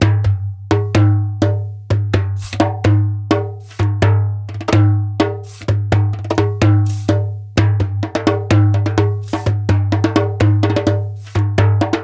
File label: doumbek beledi naked